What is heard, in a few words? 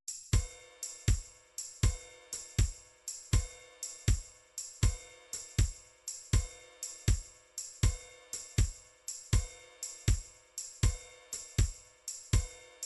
drums, midi drum patterns